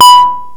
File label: ring mod, Kawai SX synths